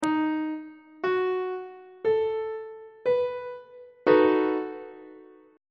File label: c flat dominant 7th 1st inversion; on treble clef